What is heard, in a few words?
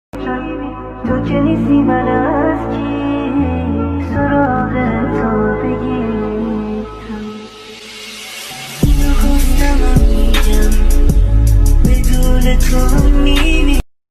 sad turkish instrumental ringtone emotional ringtone